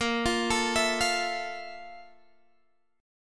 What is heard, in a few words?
F Major 7th Bb; Fmaj7/Bb; arpeggiated